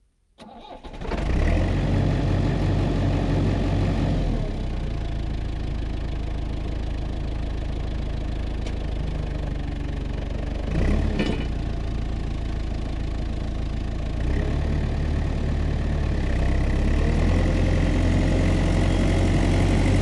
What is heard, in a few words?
excavator mf 860 exterior engine turn on idle and slowly drive away stereo; fvtt sonniss gdc audio